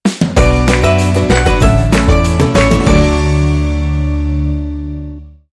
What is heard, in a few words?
Upbeat Sugary Folk, Sting